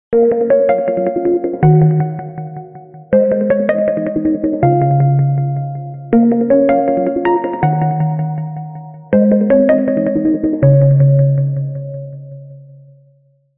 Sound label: longing